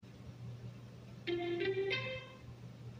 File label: advertisement sound